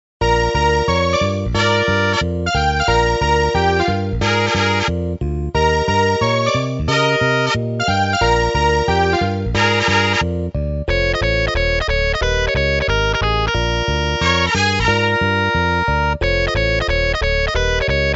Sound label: Guitar